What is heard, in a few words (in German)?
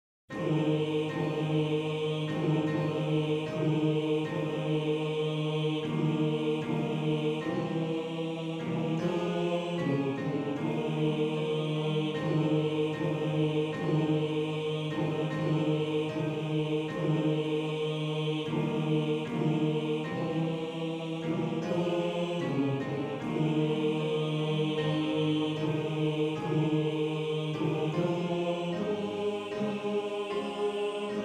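Bass 1, Männerchor; Wohin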